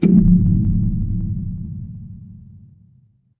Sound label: magic scifi shield